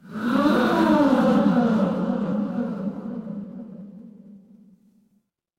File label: crowd gasp